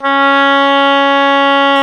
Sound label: OBOE, mf f; Roland L-CDX-03 Disk 1; Oboe 2&3